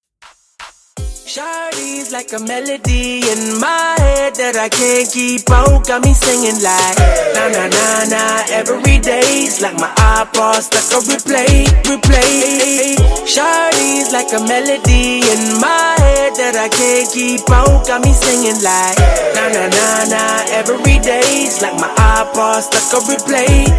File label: Dubstep Trap Mashups; Remixfreakz